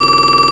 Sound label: Ring; Recycle!